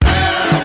disco punch; Punches Hits Discoblasts; single hits

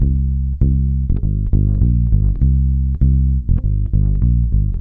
100 fingerbass walking; MELODIC LOOPS

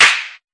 mega clap; Claps; single hits

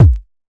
lofi kick; Kicks; single hits

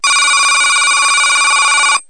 annoying phone; Sound FX Real Life

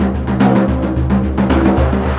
111 grain silo drum, drum loops and breaks

